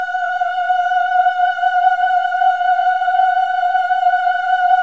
VIB M F#5, Symphony of Voices, VIBRATO; Spectrasonics

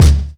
BD BREAK, Korg EMX-1, Drum Machines

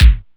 Kick, DIGITAL KIT

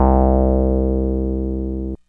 TB303 SQR, ROLAND TB-303 BASS

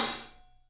Studio Hats, 3DPercussives; E-MU Producer Series Vol. 5 – 3-D Audio Collection

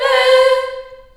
C4 child ehs; USB Soundscan vol.28; Choir Acoustic & Synth; AKAI

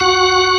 full fast, ToneWheel Organ; E-MU Formula 4000 Series Vol. 3; Analog Odyssey

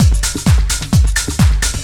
drum 130 groove; Techno Trance; DRUMLOOPS; Techno Trance Essentials

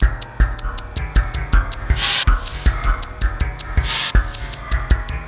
RISK LP, Industrial Loops

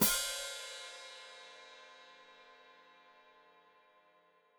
Hat Closed Open, Hi-Hat

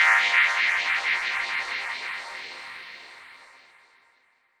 note freqshift chord; Polyend; Recue; melodic

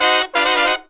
Pop & Funk Brass

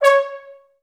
TB STAC C#4; Best Service; Peter Siedlaczek's Advanced Orchestra

